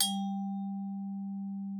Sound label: TRIS G3, VIBES; Xsample VOL-4